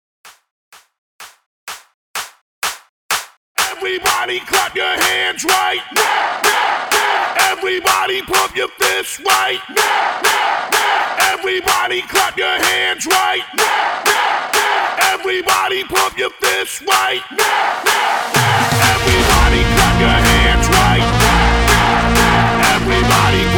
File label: PARTY STARTER 126 BPM